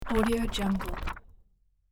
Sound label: cartoon paper rattling